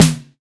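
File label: sd bounce, snare drum; drumkit; switchangel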